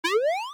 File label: jump